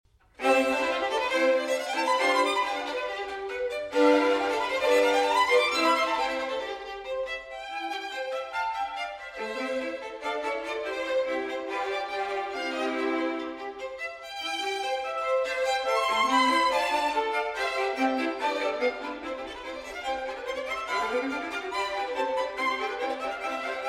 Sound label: Violin Quartet; Hofmann; Movement Samples